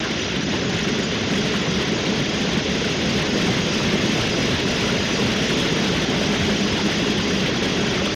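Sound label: Paddle Boat Water Wash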